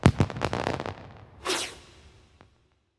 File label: Celebration Fireworks